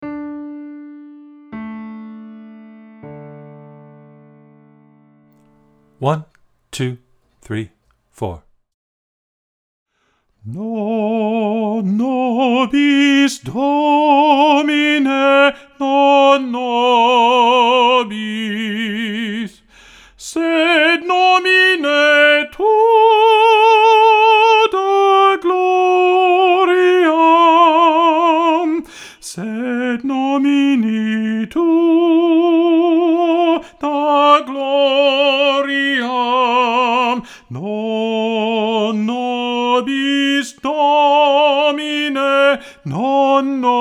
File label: Alto; Non nobis domine